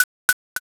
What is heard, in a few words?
rap noise